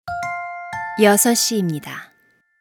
Hourly Alert

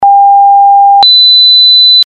2kHz 2sec mute 1sec 500Hz 2sec; LG K83 5G; elsa

